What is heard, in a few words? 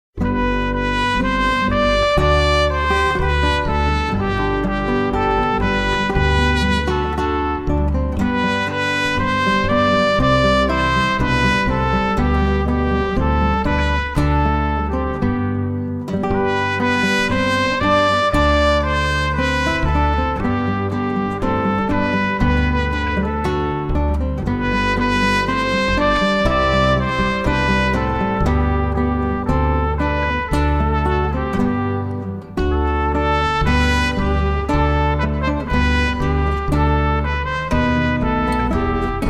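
chamber music string quartet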